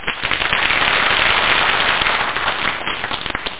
applause

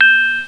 chime

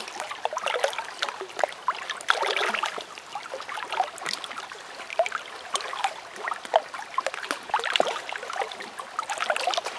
cave water; DAoC

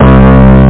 VIBE BASS

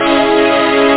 chord

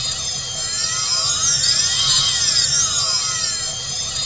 enrage level pass effect; Earth and Beyond; EA Games

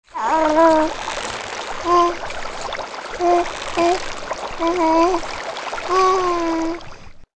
basket

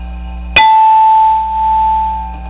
Hourly Chime; DeskMates 1.3.3

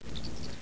tap comm

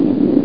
thrust; asteroids2; asteriods ii

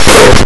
lip; Blue Heat

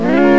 reverse sound

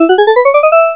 Scale; Sound Library 2000; Wayzata Technology; Instruments

